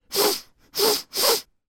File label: human man sniff short 3 times